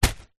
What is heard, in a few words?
foley plastic bag containing paper recycling set down